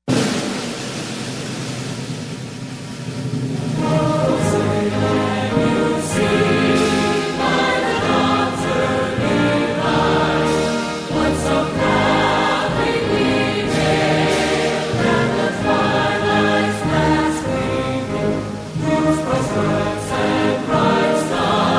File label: national anthem usaf